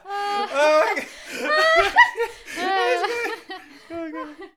Woman Man Laugh, laughs-chuckles

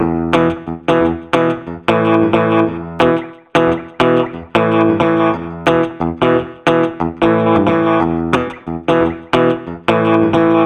Blues Guitar Rhythm Pulsing E 90

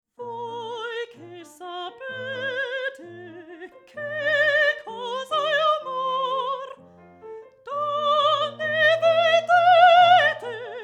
Vocal Spot Mic HF Boost, Piano and Singer

